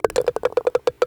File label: Golf Ball Goes In Start